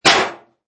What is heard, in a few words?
Impact Metal; Clanky, medium metal impact hit. Small crash or hit metal with hammer.